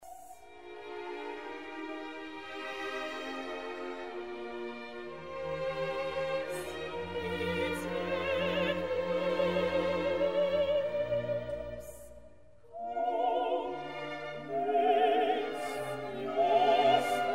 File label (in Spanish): cuarteto